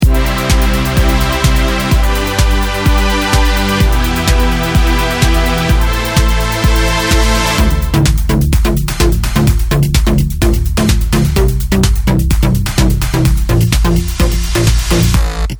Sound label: ES2 EDM Presets